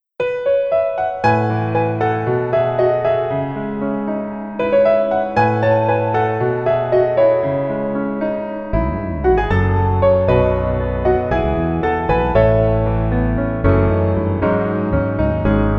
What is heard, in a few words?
Piano